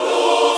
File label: choir